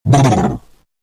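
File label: free spins grunt shake head; wild worlds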